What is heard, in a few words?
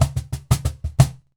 Cajon Fill; musicradar